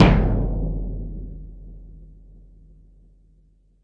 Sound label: Timpani, Roland TD 7; DRUM MACHINES